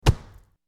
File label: Body Hit